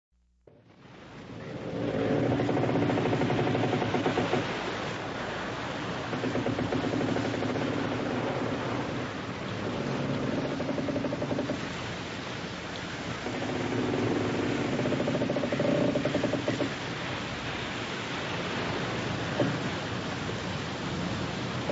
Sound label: wood creaking